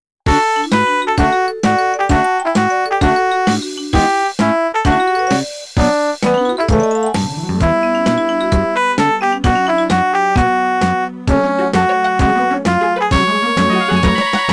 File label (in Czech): melodie